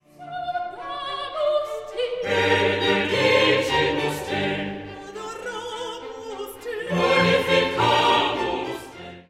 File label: Gloria Laudamus te